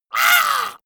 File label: monster hit high; schreie